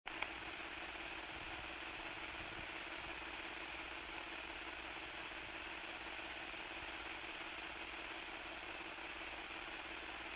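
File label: noise of the air in a walkie-talkie radio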